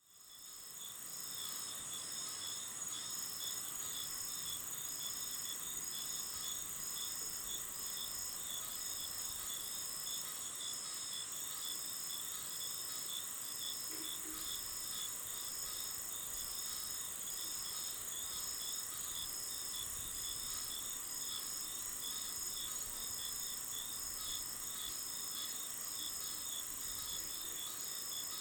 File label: ambient swamp; Lantern Forge